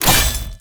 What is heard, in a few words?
sickle metal